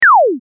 laser; sfxr

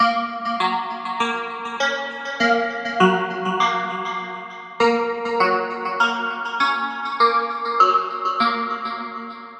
forest

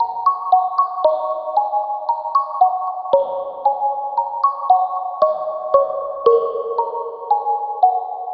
rain

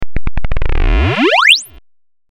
random jump, sfxr

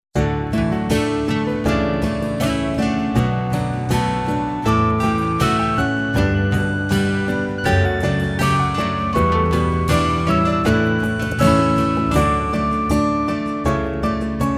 accompaniment

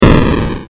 explosion, sfxr

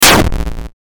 explosion, sfxr

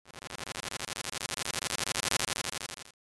shadow; sfxr

